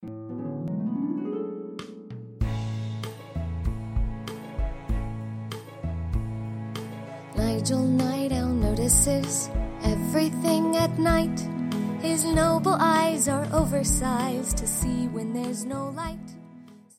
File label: nightowl Song; nigel